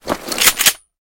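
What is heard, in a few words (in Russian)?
автоматическая быстрая перезарядка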